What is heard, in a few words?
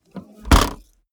car bmw e46 exterior trunk close slam